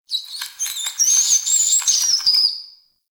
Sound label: Town Street Critter, Ambience; Warframe; Duviri